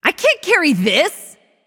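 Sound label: boohoo, female voice; smite; voicepack; hera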